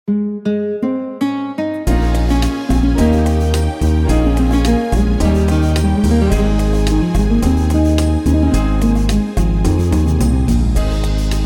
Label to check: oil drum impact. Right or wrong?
Wrong